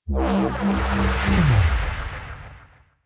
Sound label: symbol euro; Rabcat; Classic 243